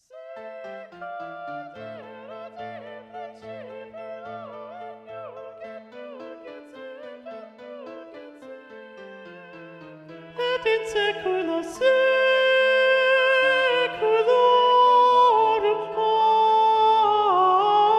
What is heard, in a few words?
alto 1 predominant; sicut erat magnificat ssa; niccola porpora; ed. hunter